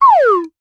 cartoon slide whistle descend

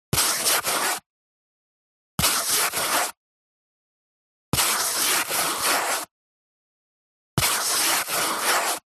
Painting PaintBrush Canvas Short; D&H; soundbox library

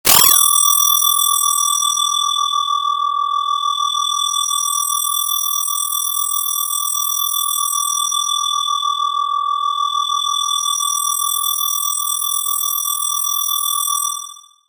frequency modulation whine shrill; sound design

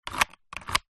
foley slider plastic slide; zapsplat

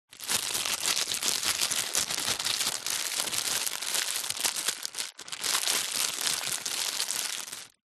foley plastic pouch small handling; zapsplat

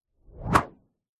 foley swipe whoosh whip fast

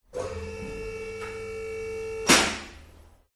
automatic car lift raising upward then stops; Sound Ex Machina

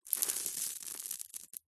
cereal cornflakes handful drop sprinkle carpet; food

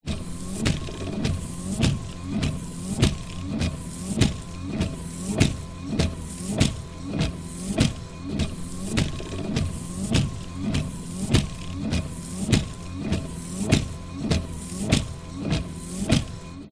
transport car windshield wiper operating external

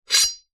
warfare knife blade draw

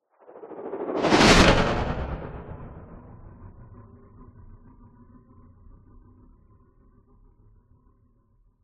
sweep fast into metallic hit and growl tail; sound design; zapsplat

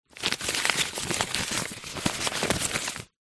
paper receipt movement scrunch; foley

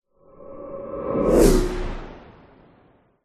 whoosh element pass by machine vehicle

